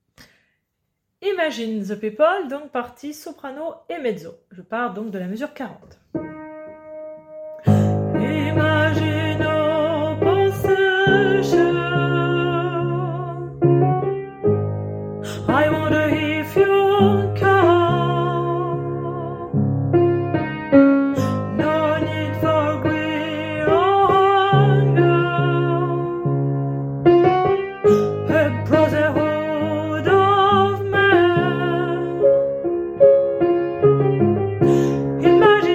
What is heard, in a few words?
Soprane et Mezzo